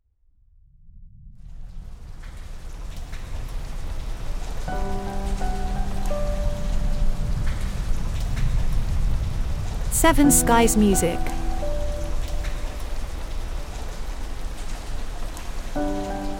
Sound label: Rainfall